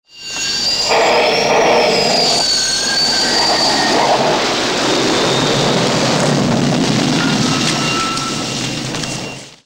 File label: Double Snort Flyby; Airwolf